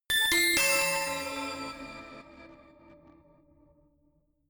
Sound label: notification glockenspiel crazy triad up minor; instrumente